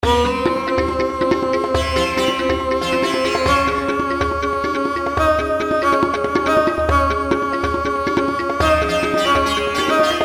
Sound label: bgm